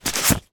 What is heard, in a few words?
money banknotes australian polymer hand out count hand; foley